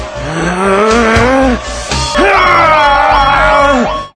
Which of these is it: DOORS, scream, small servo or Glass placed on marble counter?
scream